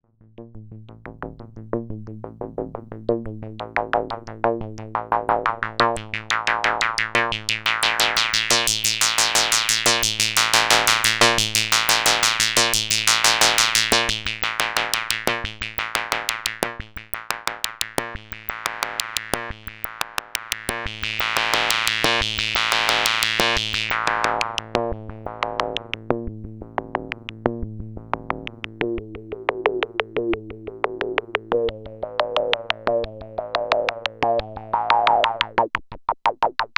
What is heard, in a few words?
Behringer Eurorack Modul 110